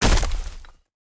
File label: Leap Impact; Caffiend